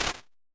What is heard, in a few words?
Restaurant, Impulse Responses; Small Spaces; Indoor Spaces; Apple